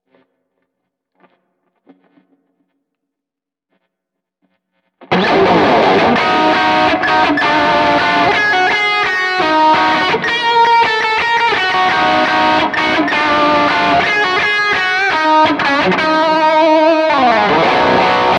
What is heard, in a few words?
Guitar Middle